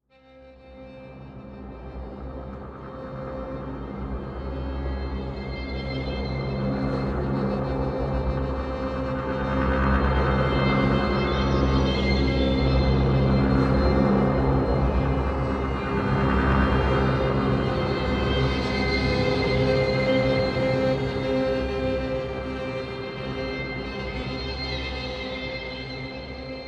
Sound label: Action Drone clusters